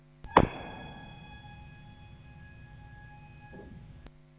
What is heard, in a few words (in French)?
marteau